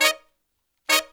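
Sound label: Pop & Funk Brass, 110 Eb, left; Best Service ProSamples vol.25; AKAI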